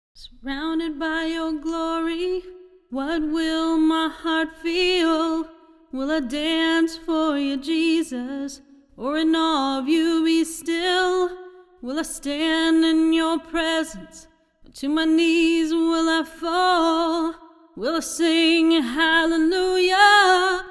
Vocal Close Hall

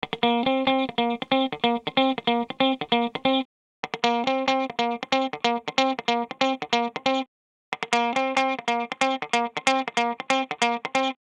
Dry Dist EQ

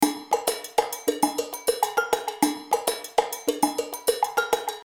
Perc Acoustic Space

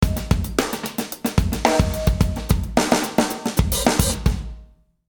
Drum Loop; 1073Comp

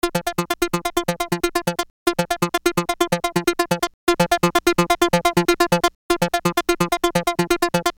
Key, Mullard Short Plate

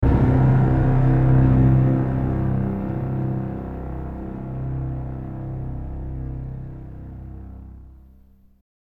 String

